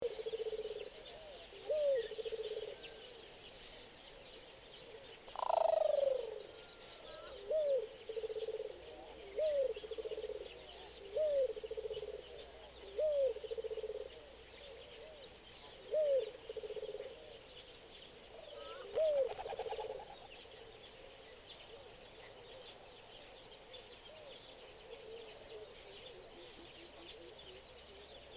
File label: mourning collared dove